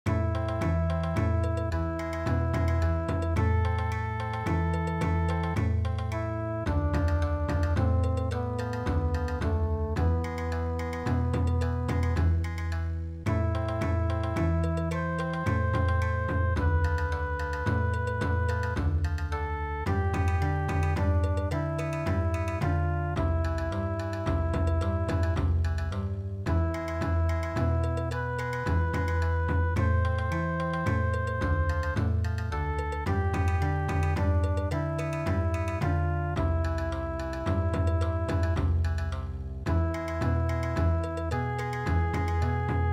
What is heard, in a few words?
Bon Odori, midi